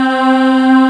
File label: Vox Synth C3; Proteus 2000; Digital Sound Factory Proteus 2000